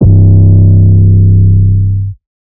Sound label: 808 Bass Classic C3; Slate 808 Deep Dive; SD Signature 808s